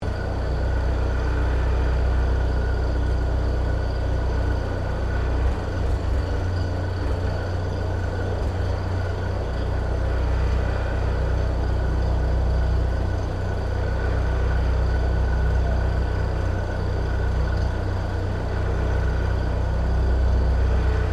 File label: Tractor Furguson 1956 idle, Old Farm Tractor; Tractors; Vehicles